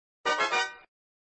Fanfare; flipper

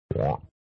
Sound Frog quark

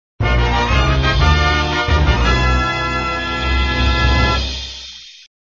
Music, flipper; sttng

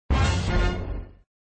Sound Fanfare End of Ball

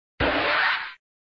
Sound SFX, flipper; sttng

